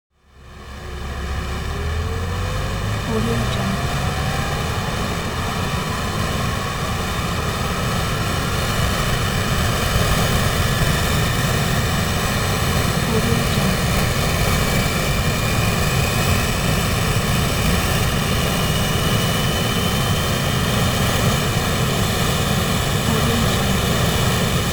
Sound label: transportation jet military a10 thunderbolt turbine rev up